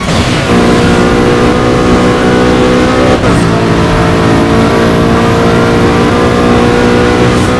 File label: turbo, vehicles